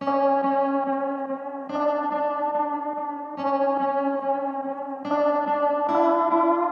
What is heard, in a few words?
Melody Loop - 143 BPM F# Min Guitar; Melodies; Loop Stems; Cymatics; Oracle Sample Pack